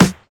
Clean Steel Snare Drum Sample F# Key, snare drum tuned to the F# note